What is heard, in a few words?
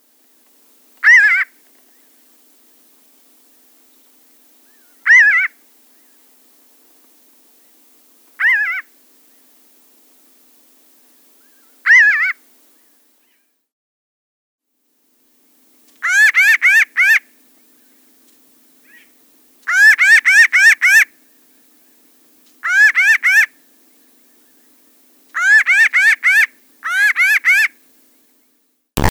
Pinyon Jay